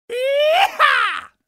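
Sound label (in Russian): krik kovboya, cowboy shout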